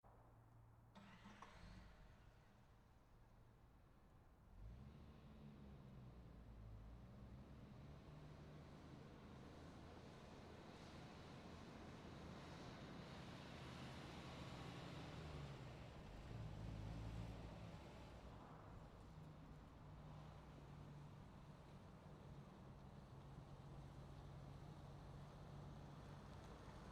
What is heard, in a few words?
car pull in